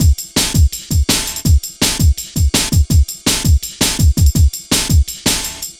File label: Cymbal Steppa, Drum Loops 165.5; Zero-G Jungle Warfare 1, 2 & 3